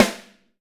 6X12 ALUMNUM, Living Drums; Sampleheads; Peter Erskine

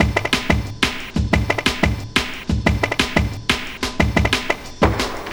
Pool Hall Beat, Drum & Bass; Fluid Dynamics

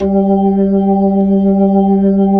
B-3 Jazz G4; Keyboards of The 60's and 70's

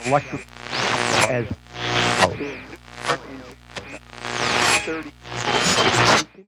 Radio Sweeps, Short Wave; Sonic Foundry; The Electronic Music Manuscript Collection; EVP Short Wave Recordings

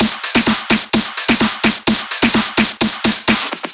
Fills 128BPM, Clubsounds

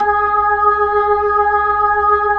B-3; Jazz; Roland LCDP10 Keys of the 60s and 70s 2

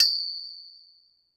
Drum Machines, Korg 01W; 300 Drum Machines; TotalKit

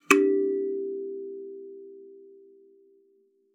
KALIMBA G4; Xsample VOL-7

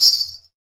PRC METAL, Afro Shakers, Ankle Pods; Roland LCDP14 Africa VOL-2

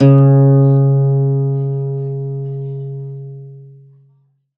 ZITHER, Alpine Volksmusik; Best Service

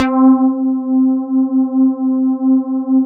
SYNBASS; Synthesizers; Soli-Music; World of Synthesizers VOL-1

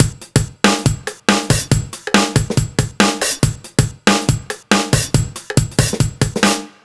140 Fill, Drum Loops; Zero-G; Soundclash Nu Dance Electro; Acidized Wavs; 140bpm

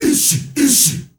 ISHIISHI L, GRP CHANT, Heart of Africa; Spectrasonics; Heart of Africa 1

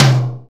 tom F5; Greytsounds; ADD-ONE KITS

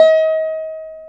veena D#6; Spectrasonics; Heart of Asia

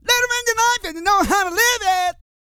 SING, GOSPEL; Vocal Planet Vol1 Gospel

